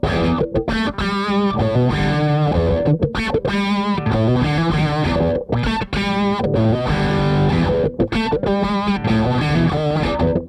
DRIVER, Funk Guitar; 90 s Sample CDs; Zero G

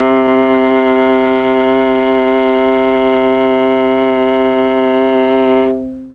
C2 VIOLA, Mellotron; Mike Pinder - Mellotron Archive CD

